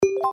Key tone ok; T390K Wine 3G; LG

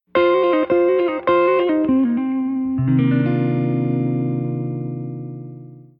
Blues; SP6

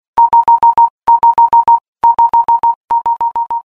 Telegraph; Power Rage

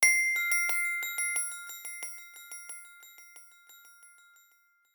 Twinkle; Samsung Galaxy-S4-mini-Value-Edition

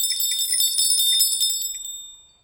Bell; Explay Bit

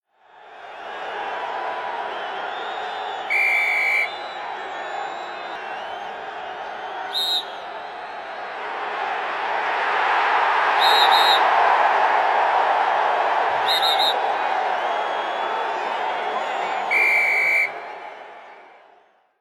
Football clock alert; Nokia; audio themes

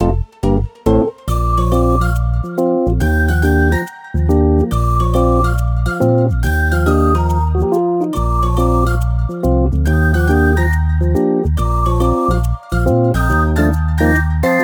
Cartoon Rhythm; Honor; X7C

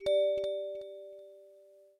abstract tiles tap, ui; Samsung; Galaxy Note Edge

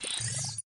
technology up; Oppo; A5s; ui

